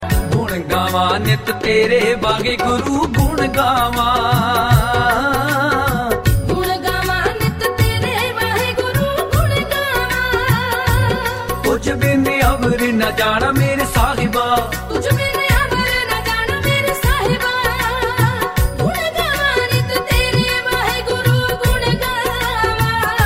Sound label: Gurbani